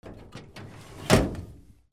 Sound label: DOORS